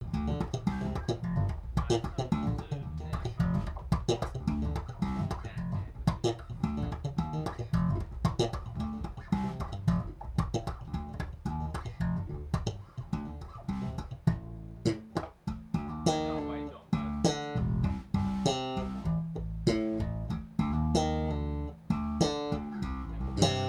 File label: tom Bass Solo